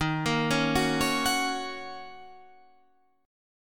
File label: D sharp Minor 7th D sharp, down, Guitar, Half Step Down; D#m7